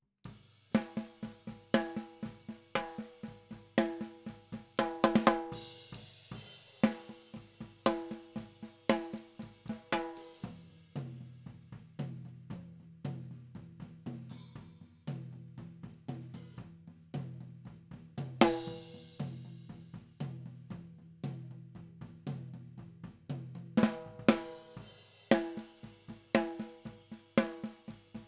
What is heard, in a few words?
Snare